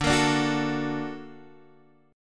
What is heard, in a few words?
A sharp Augmented Major 7th